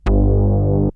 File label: Synth hits and riffs